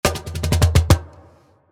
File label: cajon fill 122 wet Fm